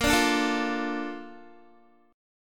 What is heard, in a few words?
A sharp Major 7th, Guitar; strummed